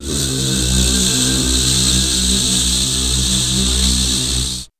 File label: WEIRDOS, Voice Spectral; Best Service; Voice Spectral II